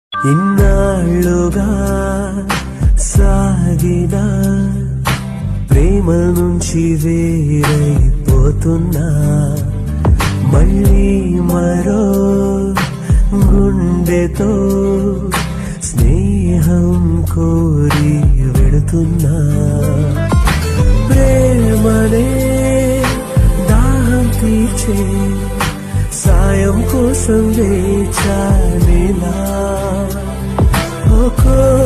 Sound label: sad bgm telugu